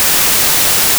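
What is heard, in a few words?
White Noise Stereo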